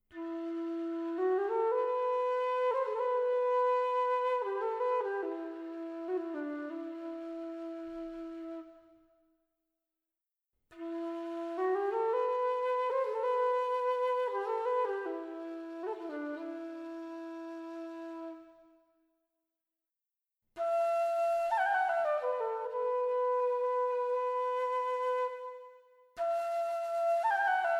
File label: flute